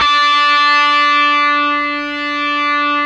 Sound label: GTR, Dirty Buzz; Club-50 - Foundations Roland